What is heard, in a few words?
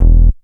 Bass E, Offbeat Bass; Club; VEC1